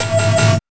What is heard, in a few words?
SYN-FX